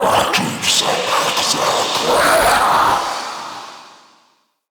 Mockery Male Vocal Finisher Success; Enemy; Mockeries

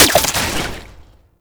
Archgun Mech; Warframe; Weapons; Archwing; Tn Nokko Archgun

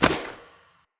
Long Sword Catch; Weapons; Player; Pothia Long Sword; Swords

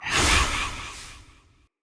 Trick Ghost Spawn Burst; Enemy; Abilities; Characters; Circade

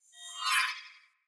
Sword Whoosh Accent Heavy; Fey Sword

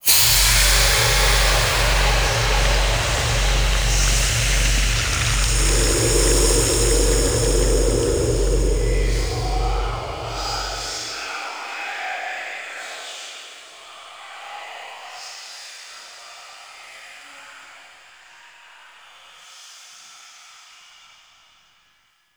Cargo Hold Lifepod Release; Call Of The Tempestarii; Wraith Quest; Cinematics

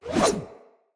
Dagger Whoosh; Weapons; Swords; Josalfar

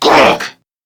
Surprised Crewmen; Warframe; CorpusCrewmen; CrewmanA

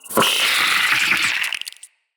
root spider vocal attack; Animals; Spiders; Vocals

